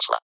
Perc, Psytrance; Hats & Percs

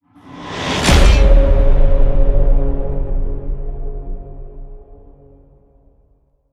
Epic

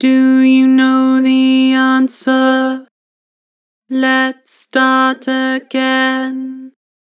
Vocals, Flat, Do you know the answer; Harmor

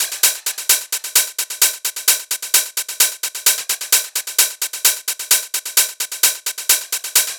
Hat Loop 130, Techno

